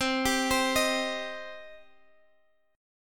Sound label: C Minor C down